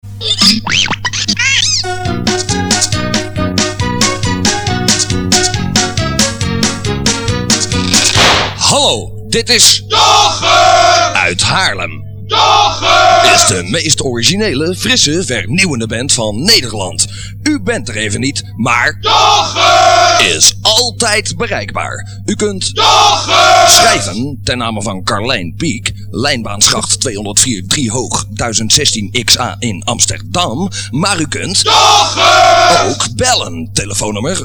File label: jingles answering machine; yoghurt music miscellaneous